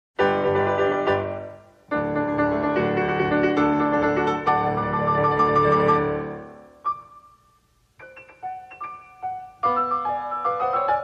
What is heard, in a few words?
MAGIC ACT PIANO